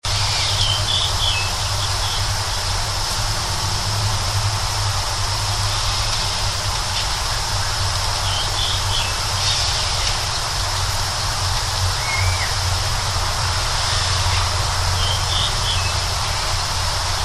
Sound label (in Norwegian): hvilken fuglesang